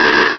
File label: cry; source of the sovereign